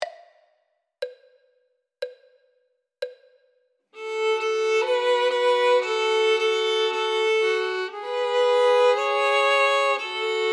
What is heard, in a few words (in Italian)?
violino I e II